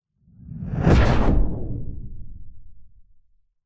sound design cinematic whoosh dark into a crack bolt impact; zapsplat